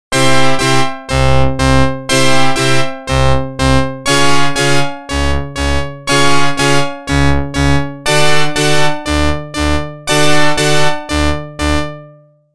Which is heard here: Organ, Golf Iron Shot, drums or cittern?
Organ